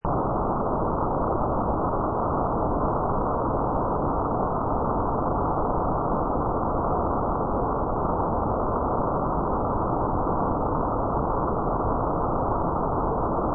Space, Outer space travelling ambience loop with subtle rumbling